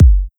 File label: Kick